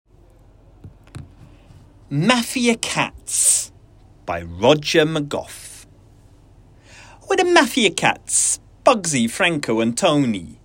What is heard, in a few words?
Mixed Voice Choral